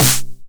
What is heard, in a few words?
909 SD Tape Sat